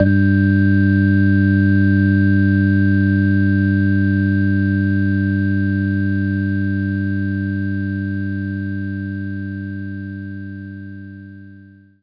organ G3; Knights Try

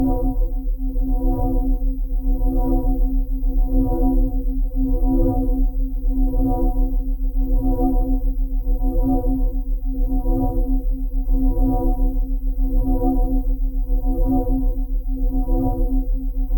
AMB SCI-FI LOOP; Strike Team Gladius

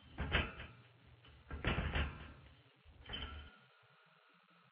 Animatronic Move

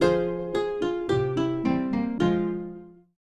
Lose; hayazashi nidan morita shogi; snes

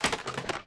Lock

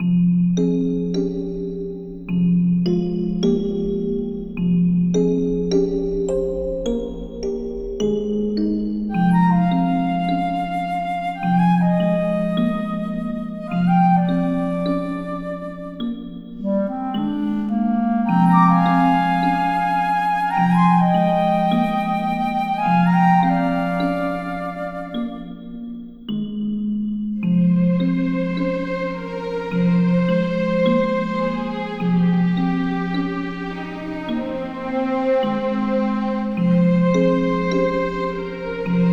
Exploration Cave

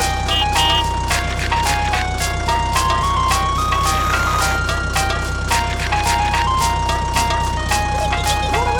food truck

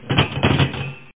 metal run; Five Nights at Freddy's; FNAF 2

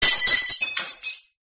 crystal sound

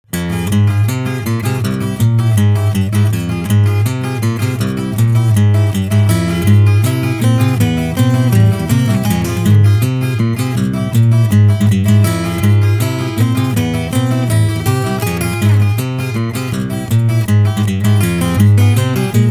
Acoustic

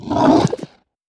Fishmen VO Attack; We Are the Dwarves

